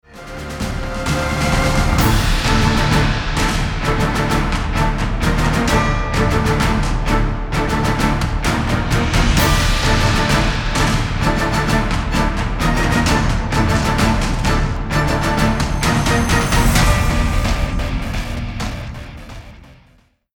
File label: Strings Kizami All